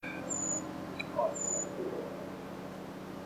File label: at lake